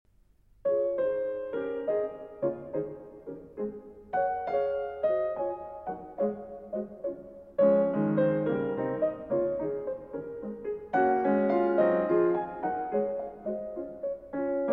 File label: Piano Sonata No. 14 in C-Sharp Minor; Op. 27 No. 2; Moonlight; Beethoven